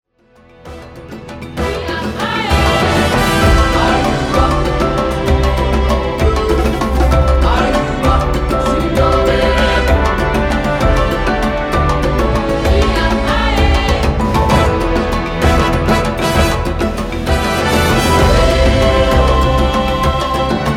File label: Fanfare